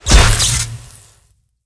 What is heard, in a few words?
skill explo